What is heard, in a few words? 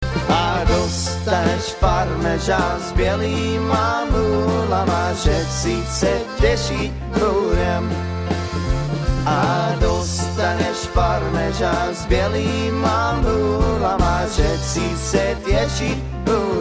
Waltz